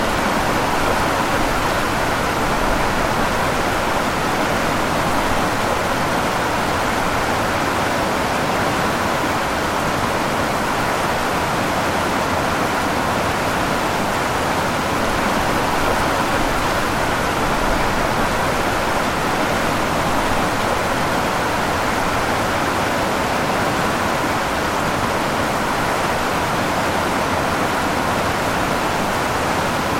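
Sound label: noisy foamy sea waves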